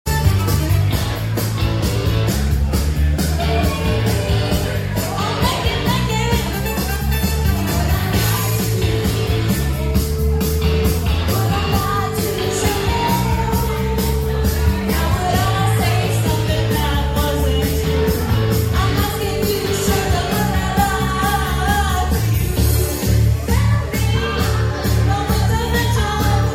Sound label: Karaoke